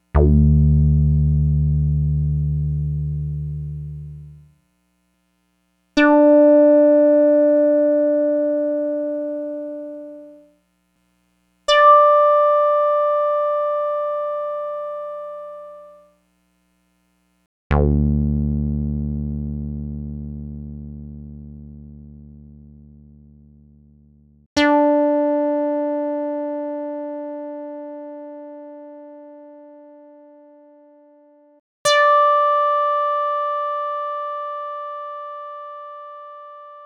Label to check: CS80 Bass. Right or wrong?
Right